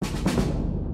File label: level up